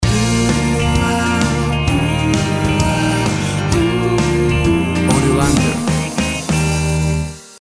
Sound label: This Town Short Stinger Mix